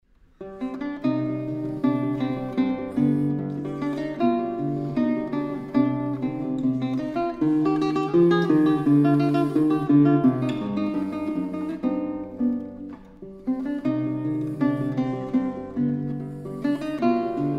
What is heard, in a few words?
guitar; Sonatas Ms84; Sonata No.33 In C Minor; Andantino; Paganini